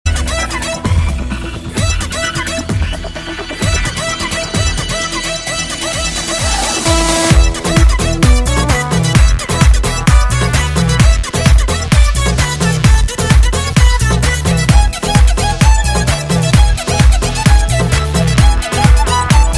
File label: Melody; Kambhakt Ishq